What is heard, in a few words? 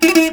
JAKAY; Spectrasonics; Heart of Asia